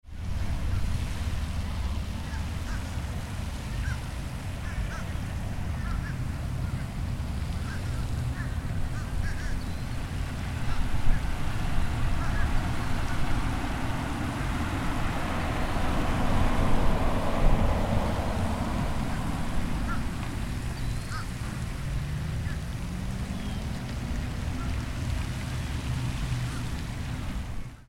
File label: Suburban Park